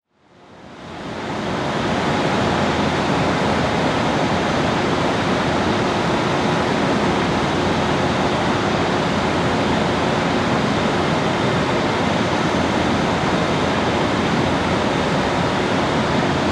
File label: Dam, water pressure; river